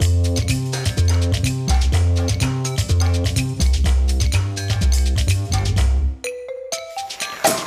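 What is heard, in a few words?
food world music paradise fight rythm x bass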